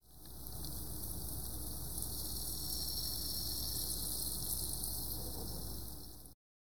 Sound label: Fizzing Soda